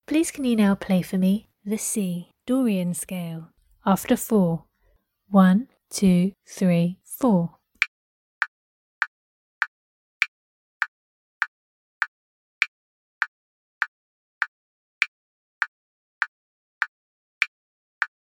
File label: c dorian scale